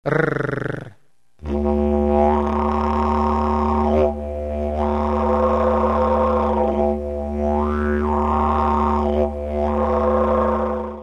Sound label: trill, didgeridoo, rolling Spanish R; Just trill your tongue like this …rrrrr…. You can do this with or without vocalizations.; Timeless Productions; didgeridoo tutorial